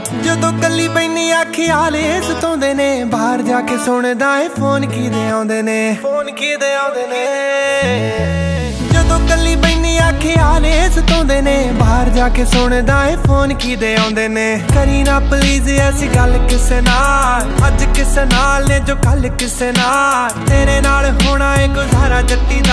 Ringtone

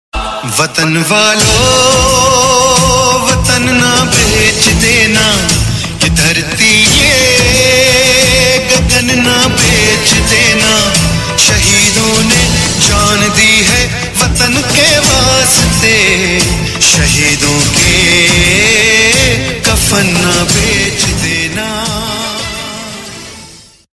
15 August Ringtone

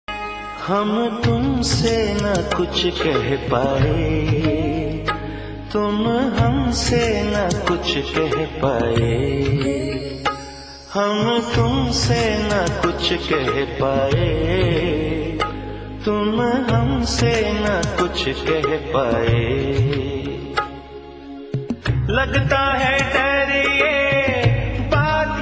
Old Song Ringtone

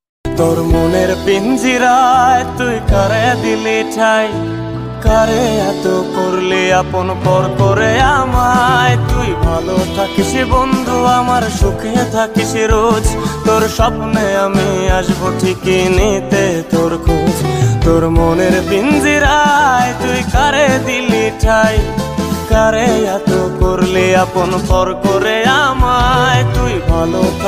New Bengali Heart Touching Ringtone

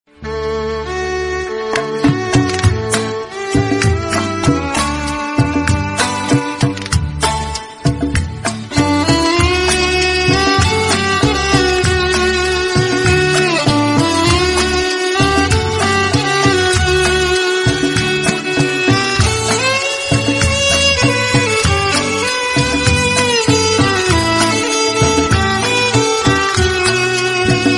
Bollywood Instrumental Song

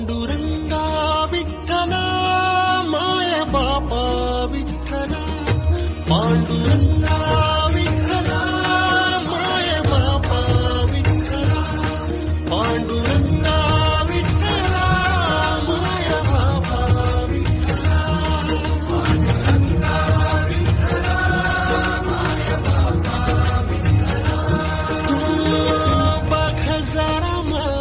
Marathi Song Ringtone

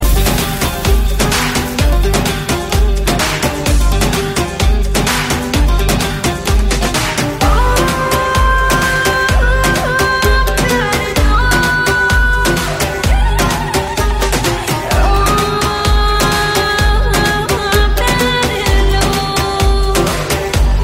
Music Ringtone

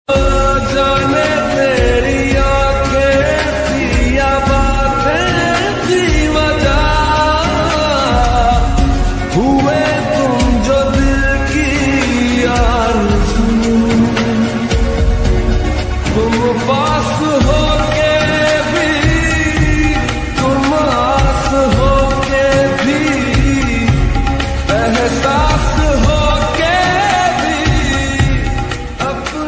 Lofi, Hindi Ringtone

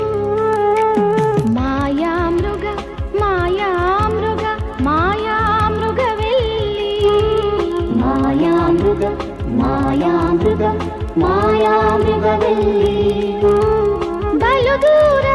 Telugu Ringtone

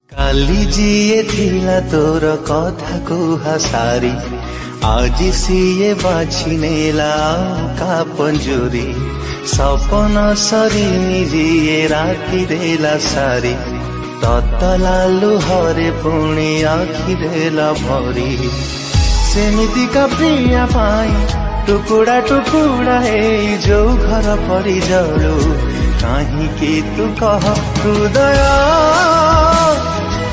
Odia Ringtone

